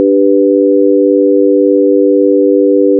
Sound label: Chord G maj